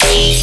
Bass Hit E, Dubstep

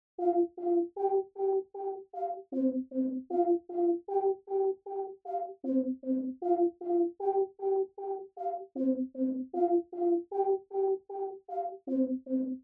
77 BPM C Min Sneaky Pad; Cymatics; 2022 Melody USB Expansion; Origin

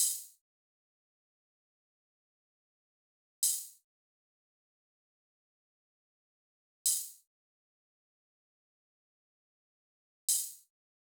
Drum Loop, 140 BPM Open Hat; Loop Stems; Cymatics; DOPE DRUMS VOL. 1; Pluto

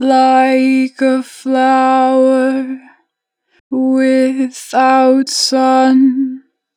Natural Vibrato, Vocals, Flower, 4 bar vib; Harmor Vocals

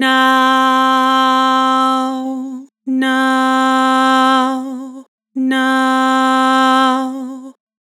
Vibrato "Now", Harmor Vocals

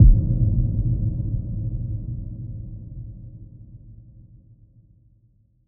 Distant Stomp; FX; Cymatics; Light Free Sample Pack; Bonus Samples

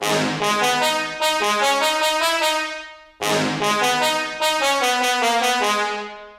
Scarlet Brass Loop 150 BPM G# Min, EDM; Instruments; Brass Loops; Cymatics; EDM Starter Pack

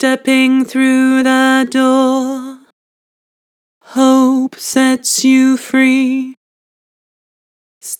Vibrato, Vocals; Stepping hope; Harmor Vocals

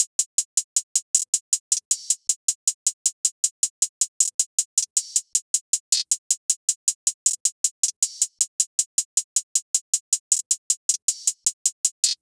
Frost Hihat Loop - 157 BPM; Cymatics; Fiji Hihat Loops & Percussion

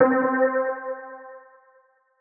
PLUCK Sprung - C, Synth One Shots

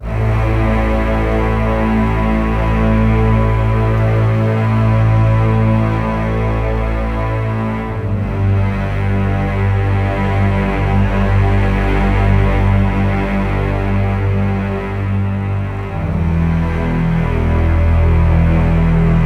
Basses - Am - 120BPM; Construction Kits; STEMS; Ghosthack; SkyRealm; Kit 09 - Shallow Valley

